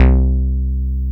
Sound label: GRUFF C2, BASSES; Best Service Alpha Dance vol.2